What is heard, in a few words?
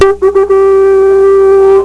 conch; wedding